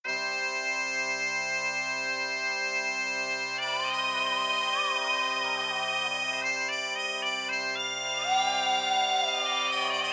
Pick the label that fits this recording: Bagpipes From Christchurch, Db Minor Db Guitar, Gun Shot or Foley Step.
Bagpipes From Christchurch